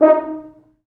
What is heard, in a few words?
4 FrHorns; D#3; East West; Quantum Leap Horns Sax; 4 FrHorns MOD